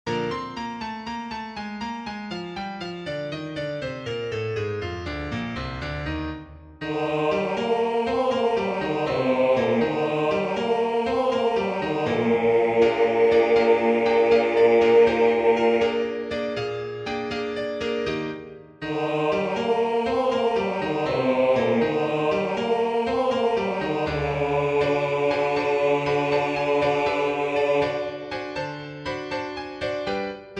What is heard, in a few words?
bass; pour o pour the pirates sherry